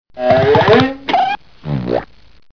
Zip Fart

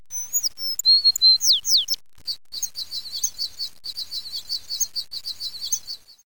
american treesparrow sound; Mono

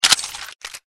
m4a1 silencer off

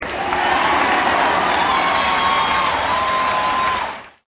crowd cheer small

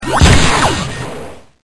jet jump

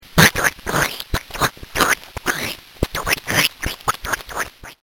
dog licks

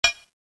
KLINK

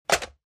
phone hang up

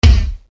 kick

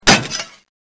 window

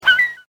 SEAGULL

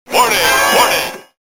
Warning

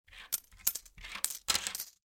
counting coins

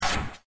LASER

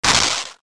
rip

